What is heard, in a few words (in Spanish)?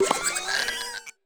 Grito de Farigiraf; Gritos de Pokémon de la novena generación